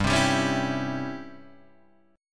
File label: Db Minor Major 7th Flat 5th G